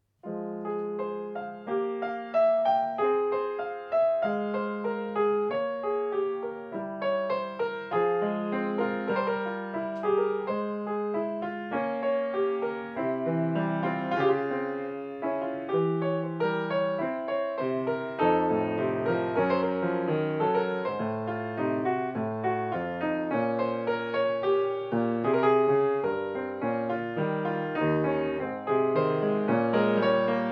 bach das wohltemperierte klavier 1 prelude 12 bwv 857, piano